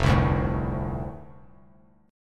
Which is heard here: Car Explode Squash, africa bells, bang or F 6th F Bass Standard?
F 6th F Bass Standard